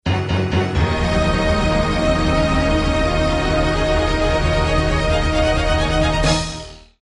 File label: Drama Alert